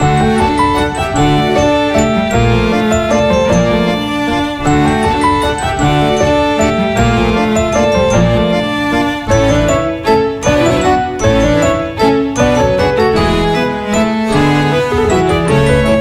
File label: Catnap loop, BGM